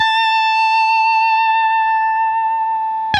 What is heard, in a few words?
solo Left-hand vibrato